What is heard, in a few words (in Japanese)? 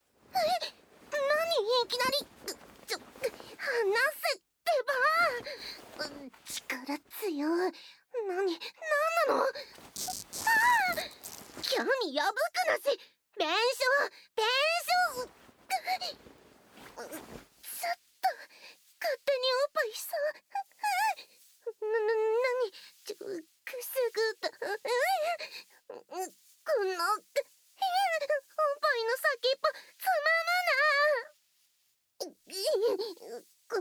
イラついて無理やり愛撫＆ディープキス（無理やり愛撫、ディープキス、絶頂）, ASMR